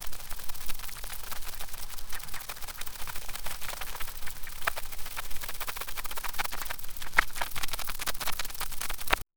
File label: a short 051s rustling skit, 16 bit